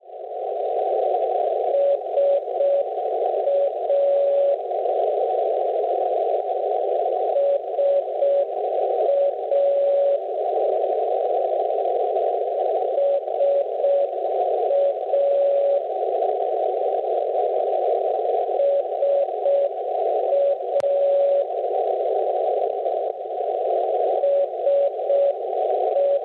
NDB 474.5 kHz; SA; Darlowo; Polonia; Schwarzsee

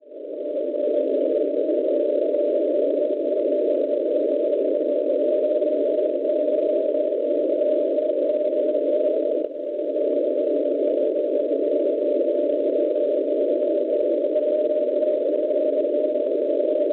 NDB 345.5 kHz; CF; Caslav; Repubblica Ceca; Schwarzsee

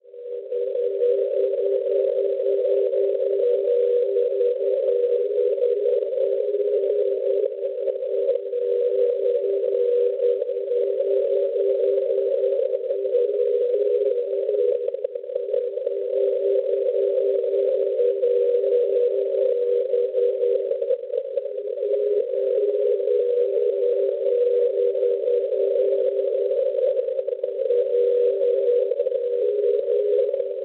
NDB, 369 kHz; MNE; Monaco; Schwarzsee

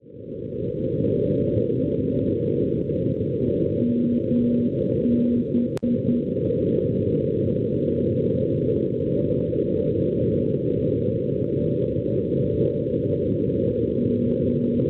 NDB, 330 kHz; MB; Montbeillard; Francia; Schwarzsee

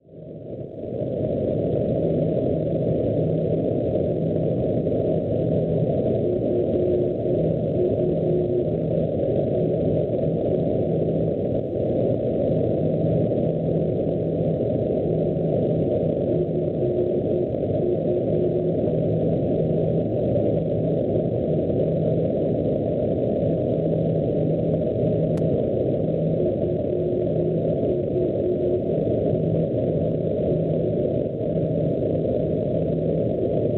NDB Amburgo Ovest Germania 350 kHz; FU; Schwarzsee

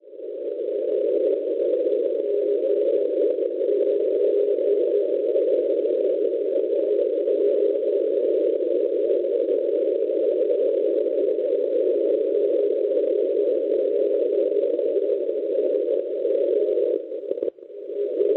NDB Biarritz Francia 341 kHz; BZ; Schwarzsee

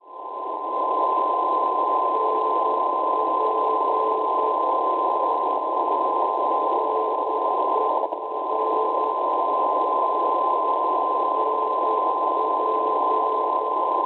NDB, 437 kHz; NP; Tomaszow Makowiecki; Polonia; Schwarzsee

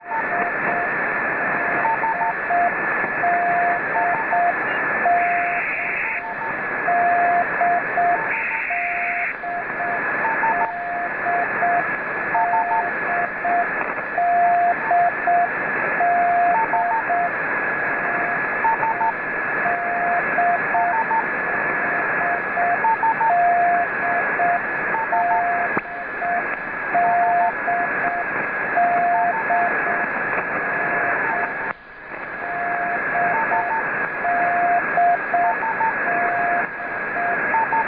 Russian Beacons 7508 kHz; FTDX5000; Schwarzsee

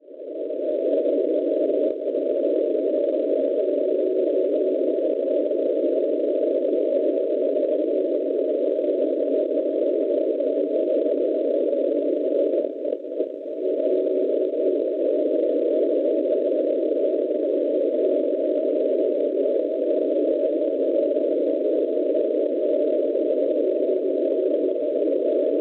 NDB, 365 kHz; L; Karlovy Vary; Repubblica Ceca; Schwarzsee

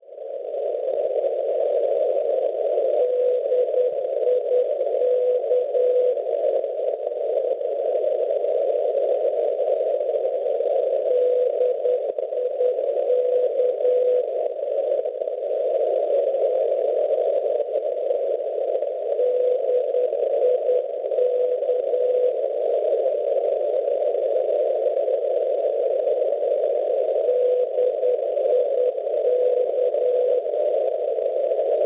NDB 307 kHz; DIK; Diekirch; Lussemburgo; Schwarzsee

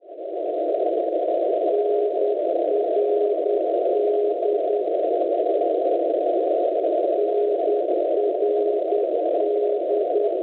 NDB, 444 kHz; NRD; Inowroclaw; Polonia; Schwarzsee

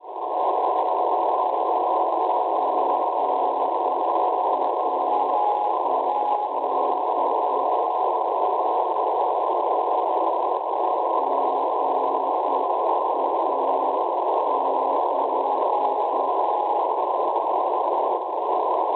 NDB Annecy, Francia 384 kHz; AT; Schwarzsee

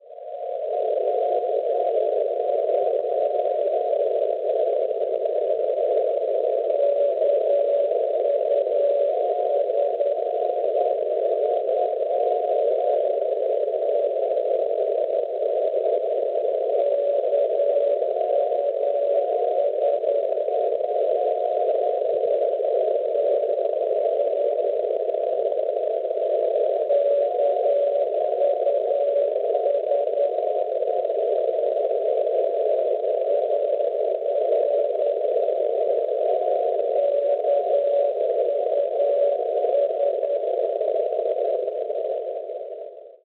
NDB, 343 kHz; KUS; Kaunas; Lituania; Schwarzsee

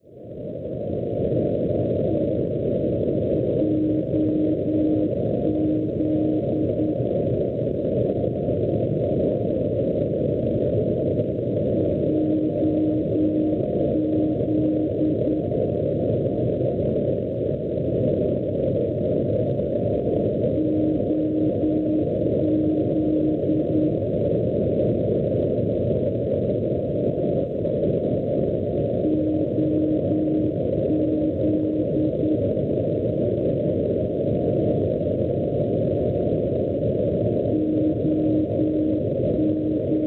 NDB, 317 kHz; OZ; Kardla; Estonia; Schwarzsee

